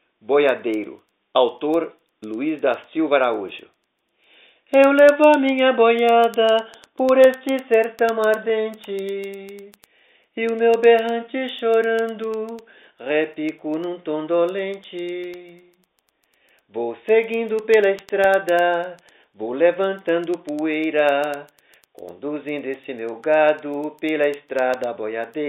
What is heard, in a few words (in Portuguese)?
boiadeiro